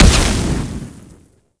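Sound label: Projectile Impact; Duviri; Incarnon Dera Vandal; Weapons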